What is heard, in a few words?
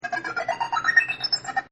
Game SFX, Arcades; Backgrounds; Partners In Rhyme